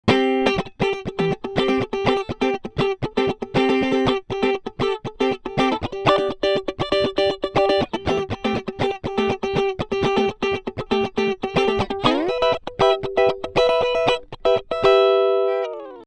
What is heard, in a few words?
Rupert Neve Designs Portico 5043 Guit